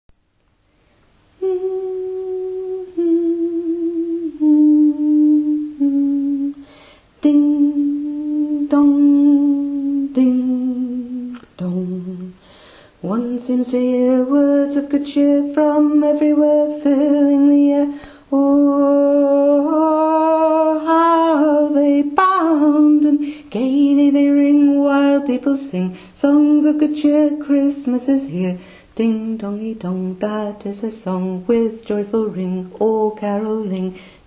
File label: alto 2 tenor; carol of the bells